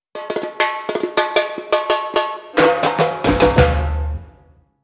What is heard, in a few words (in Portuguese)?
Duelo Bateria